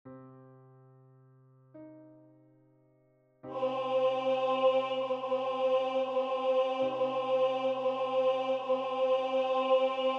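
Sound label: miserere gounod tenor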